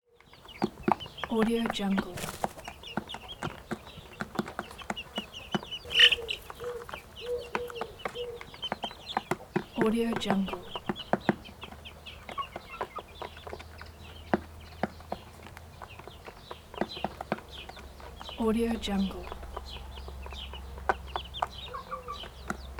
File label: chickens eating